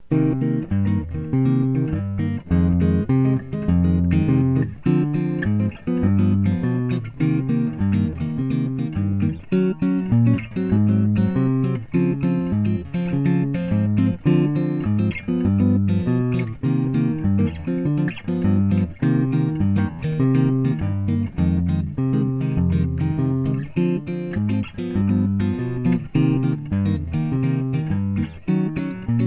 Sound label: bossa duo comp